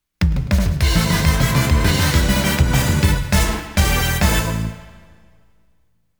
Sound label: Victory Dance (Stage Clear); Kyukyoku Sentai Dadandarn; Konami; fighting games